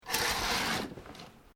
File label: curtains hallway small close, Household Curtains; Living Room